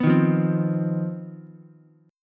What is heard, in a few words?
C Minor Major 7th Double Flat 5th C down Ukulele; strummed; JGuitar